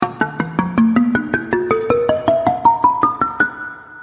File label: gambang; GAMELAN